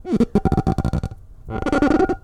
wiping plate with wet hand clean shine creak alien grind squeak squeaky plate ceramic long; sonniss gdc